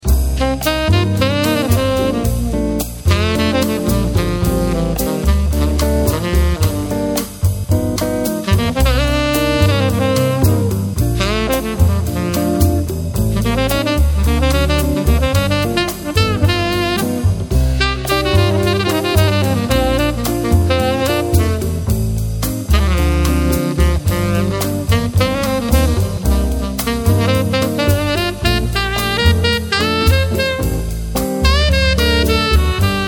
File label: Tenorsax